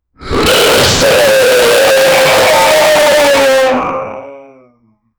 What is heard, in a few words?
sound of a monster dying, agonizing